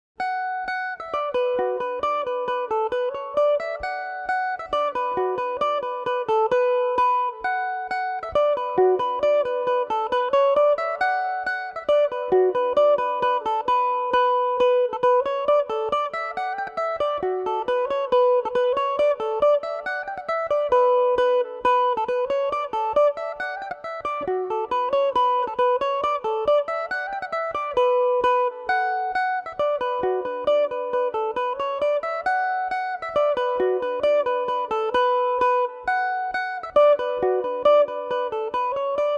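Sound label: polka B minor revelation, irish mandolin; The Goat’s Polka (personal “version 2” setting); Revelation Jaguar electric mandolin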